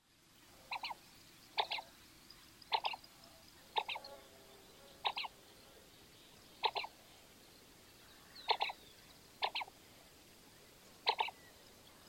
pato cuchara, Anas Platalea, Red Shoveler; cantos

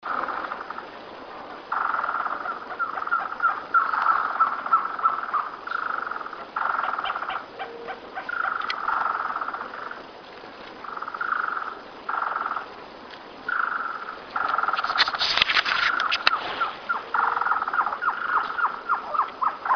Surucuá Común, Trogon surrucura, Surucua Trogon; cantos

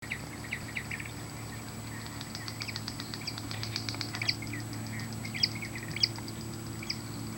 tachuri; cantos

